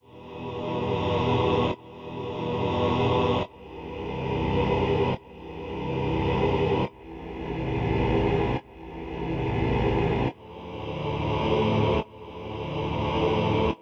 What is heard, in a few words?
Horror